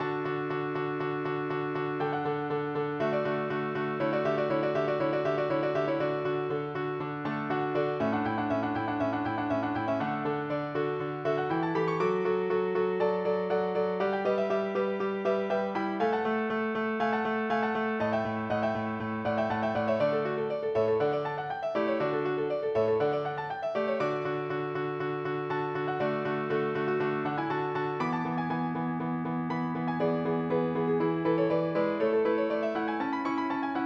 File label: divert; classica